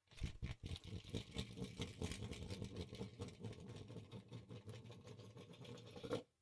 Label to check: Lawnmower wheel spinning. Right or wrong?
Right